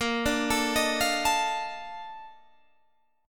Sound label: Bb Major 11th Bb down Guitar Standard; arpeggiated; JGuitar